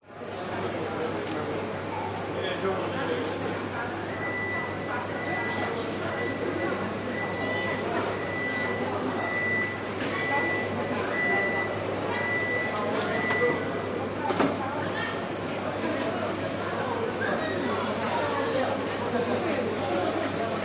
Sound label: airport arrivals ambience busy people walla larnaca int cyprus